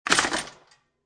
Toaster Bread Popping Out, Household, Toaster, Bread Popping Out Of Toaster